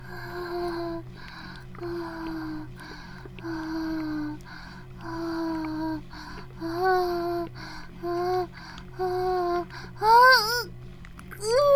バイブ放置; イクたびにわけて

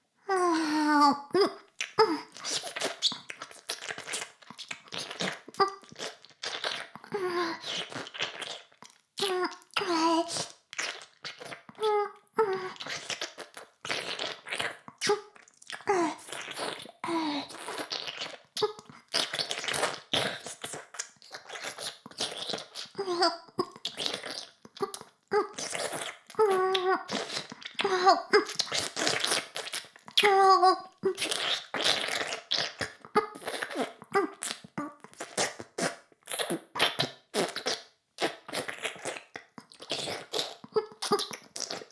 フェラ音声; 古き城のドラゴニート2

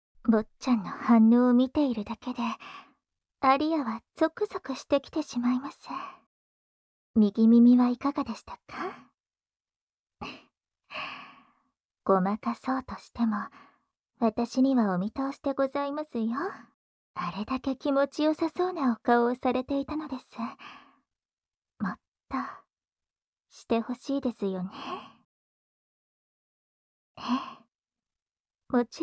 おまけトラック・耳舐め左耳

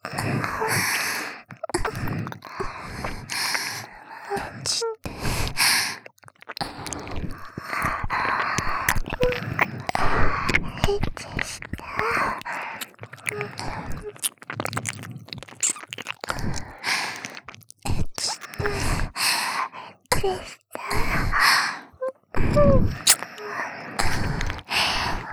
耳舐め 喘ぎ; エッチしよ

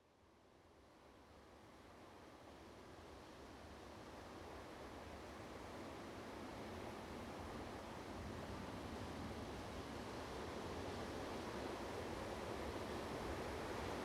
湧き水の音。飲む、浴びる、タオルを浸して絞る; アルジェ; 猫吠埼湧水

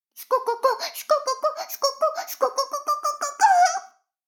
シコシコ音声; 逆時間停止されて

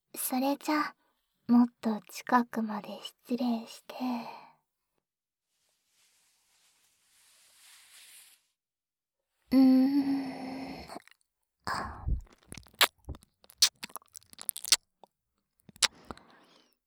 リラックス耳舐め, ASMR; すばるのリラックス耳舐め

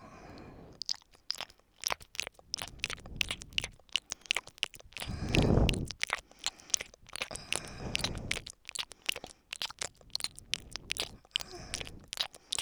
左耳深め＋吐息

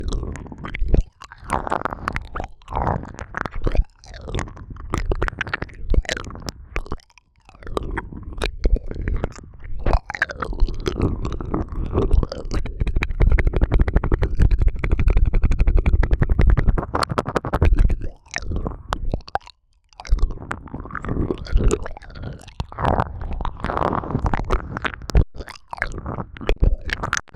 猫族の子のべっとり耳舐め

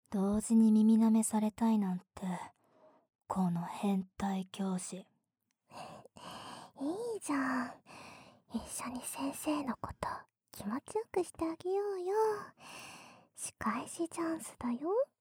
両耳舐め; とあるお昼休みのいたずら

